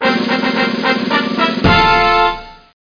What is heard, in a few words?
FANFARE